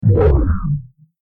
time stop; FXHome

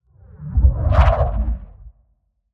Whoosh; SoundBits; Whooshes & Impacts

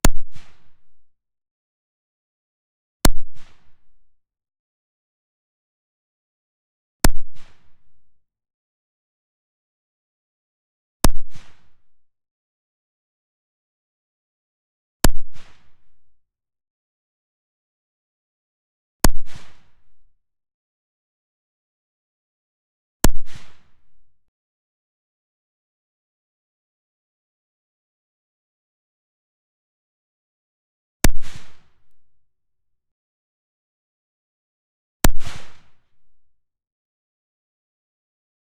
Barrett M98B .338 Lapua on gun clean Single shots; DPA4062

